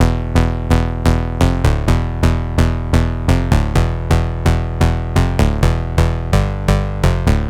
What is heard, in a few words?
Melody Loop 128BPM E Min, modern bass house